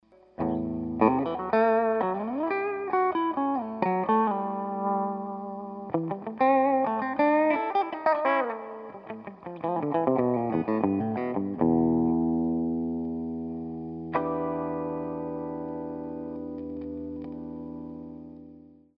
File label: walnut bridge pickup